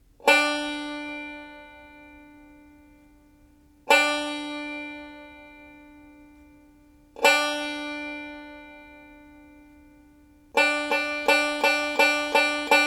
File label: 1st string picks